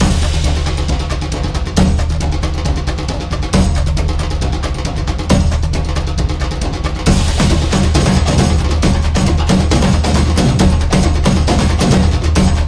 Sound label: Samba Drums